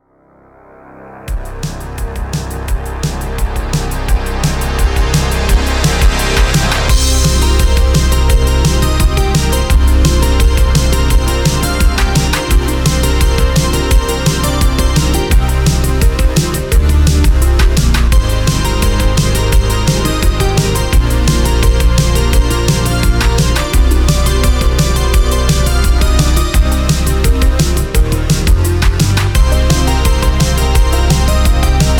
Rhythm Theme (171 BPM); Yum Yum Cookstar

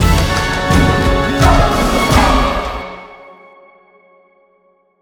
sting quest accept short; OK K.O. Lets Play Heroes

